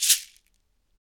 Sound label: SHEKE, Afro Shakers, Shekeres; Roland LCDP14 Africa VOL-2